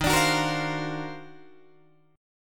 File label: D sharp Minor Major 13th, Guitar, DADGAD